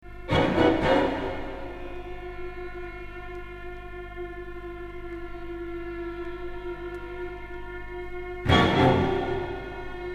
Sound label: Footsteps